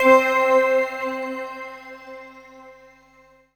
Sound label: synth bell